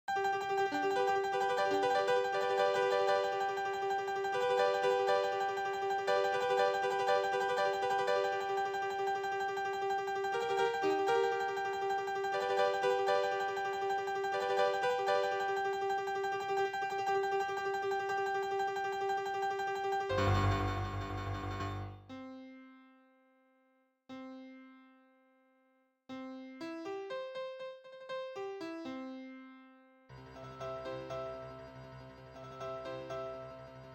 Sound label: Fanfare; Magic Knight